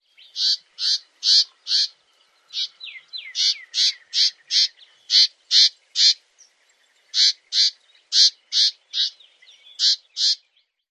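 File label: calls, Black-capped Vireo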